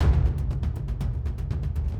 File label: multiplier Beat